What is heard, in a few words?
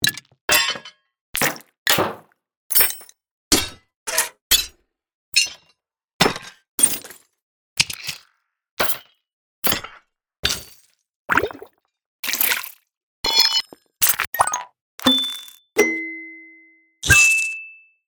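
Inventory, Organic UI & Building Game SFX; APPlicable Sounds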